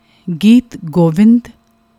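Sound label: geet govind, singing styles of Gurmat Sangeet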